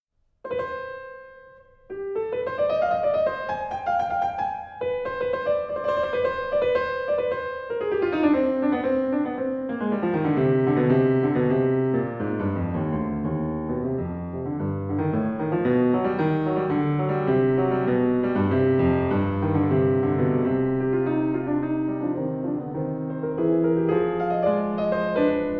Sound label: toccata and fugue in c minor bwv 911; bach